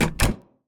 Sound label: door close